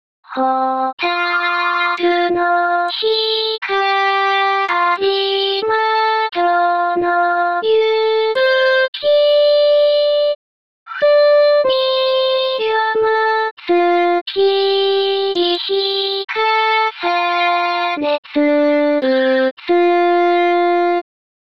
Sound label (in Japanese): hotaru utagoe